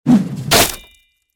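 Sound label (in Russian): томагавк в стекло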